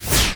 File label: WHOOSH Air Very Slow; Infernal Radiation